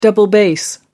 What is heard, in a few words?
double bass